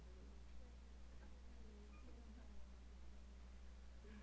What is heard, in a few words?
cry